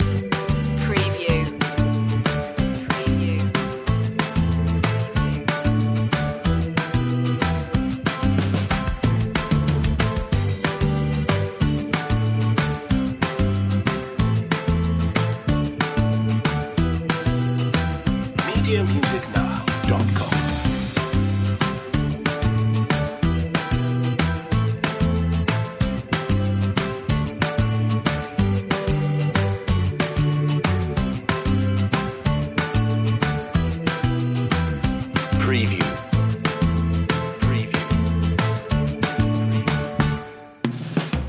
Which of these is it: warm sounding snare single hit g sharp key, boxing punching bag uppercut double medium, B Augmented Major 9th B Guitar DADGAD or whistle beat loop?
whistle beat loop